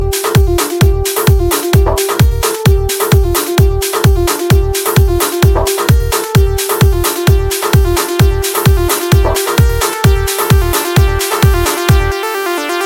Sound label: Mixing Flute; Valentine Day Special (2015); Valentine Tones